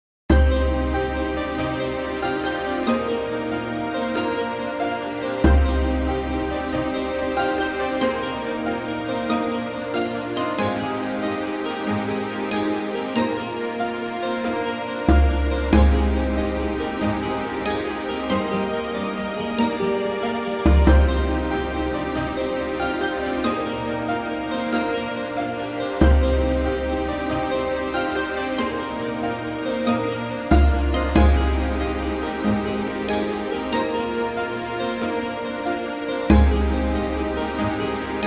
orchestral baton; Vintage Collection VGM SFX tracks & Stuff VOL I

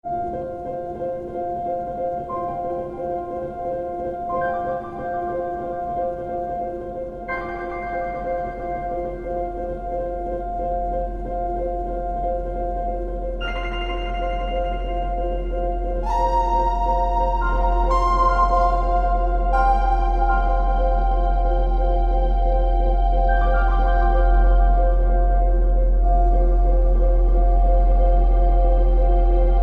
the volcanic sea shore, free music; lvl 8

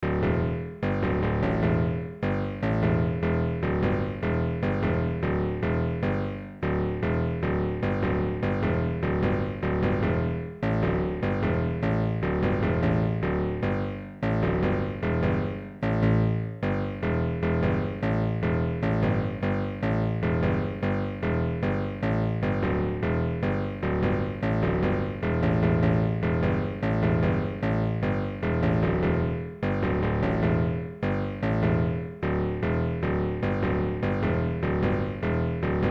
menu

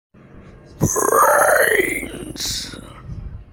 zombie brain sfx; zombie pack sfx

